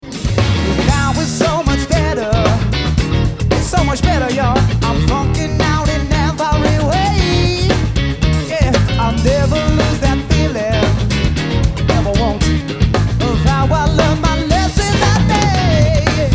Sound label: Funky Music